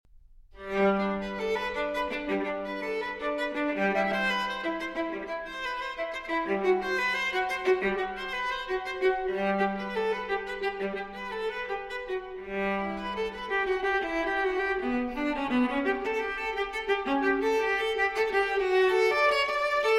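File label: Cello Suite No. 1 in G Major Transcription For Viola Prélude; J.S. Bach; BWV 1007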